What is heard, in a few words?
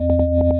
nav buoy